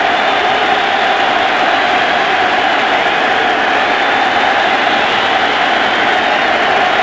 Idle, Crowds; XBOX; AND1 Streetball

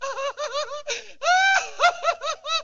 laugh; Virtual Pool Tournament Edition

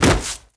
bodyfall stone, foley

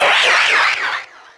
car hit

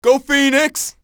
SUNS, fan; NBA Inside Drive 2004

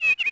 shops bird; Tony Hawk's Project 8; XBOX

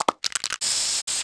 board spray; Tony Hawk's Project 8; XBOX; bedroom

